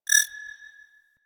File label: Library Scanner Target Acquired, UI; Warframe